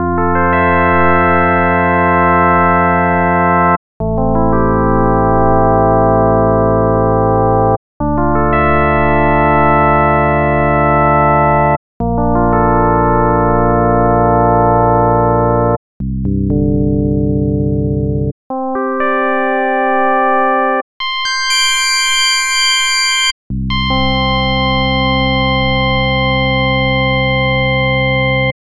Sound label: fm phase mod